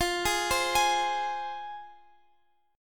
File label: F Minor, Guitar, Drop D